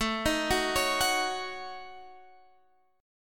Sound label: A 6th Flat 5th, Guitar, Open F; A6b5; arpeggiated; JGuitar